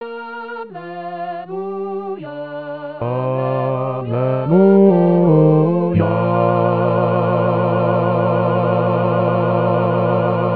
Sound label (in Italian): Canto Al Vangelo Bassi II